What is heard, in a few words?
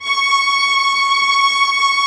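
viola legato; Multisamples; Digital Sound Factory Virtuoso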